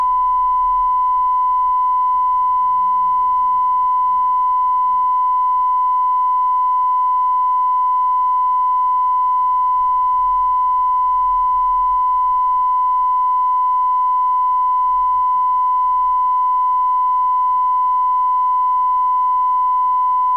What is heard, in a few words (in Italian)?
Calibrazione 94dB; Car-noise; Marea